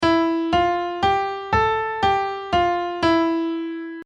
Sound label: Minor Scale Piano